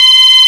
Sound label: FUZZOR C5, SYNTHESIZER; GLUNGE